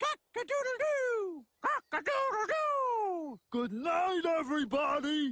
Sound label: rooster crowing